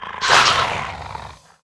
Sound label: attack